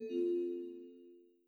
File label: Windows Battery Low; GMM Windows 10